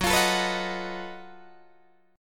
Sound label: Gb Minor Major 13th Gb down Guitar DADGAD; strummed